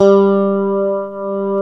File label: GTR, Clean E.Gtr; Roland LCDP02 Guitar and Bass